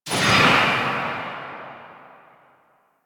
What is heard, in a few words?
Gunblade Automatic Tail, Weapons